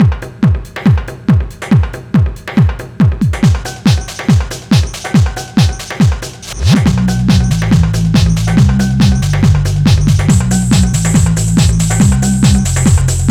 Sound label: Mix, Club Techno, 140 BPM; Zero-G; Construction Kits